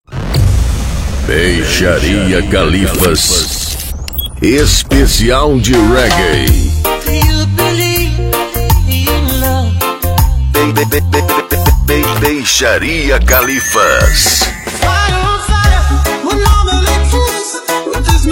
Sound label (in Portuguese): Reggae